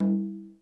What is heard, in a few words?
SLID CG, PERCS; Crypton - DrumBase5000